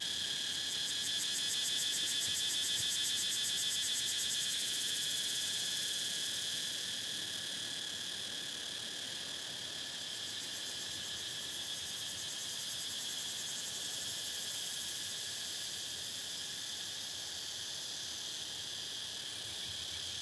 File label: Cat Tien National park cicadas